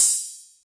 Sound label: crunchy kit crash; STYLE KITS (Hip Hop, Etc)